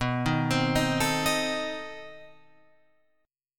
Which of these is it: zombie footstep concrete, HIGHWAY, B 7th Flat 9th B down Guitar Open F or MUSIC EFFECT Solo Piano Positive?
B 7th Flat 9th B down Guitar Open F